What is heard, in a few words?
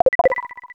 freeze; mother2